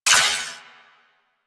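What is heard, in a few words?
submachine gun Shot; Warframe; Tenno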